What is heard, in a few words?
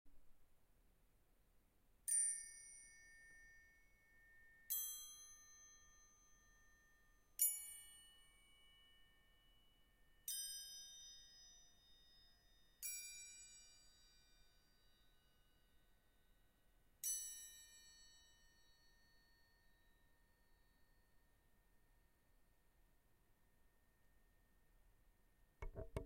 brass